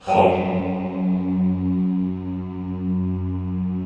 CHO F#1, Vohmm Hohmm; Sound & Vision; Gigapack I CD 2

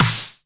Snares; Vengeance - Essential Clubsounds Vol.4

